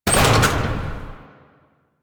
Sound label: Glyph Puzzle Coop Locker Open; Warframe; Duviri